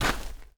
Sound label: dirt run, footsteps; chief